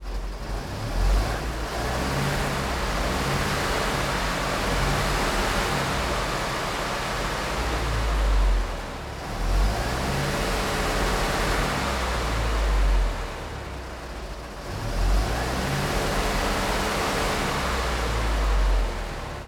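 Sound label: SKOT Onboard Idle Revs Interior Drivers Compartment; OT-64 1968; Pole Position; AMBEO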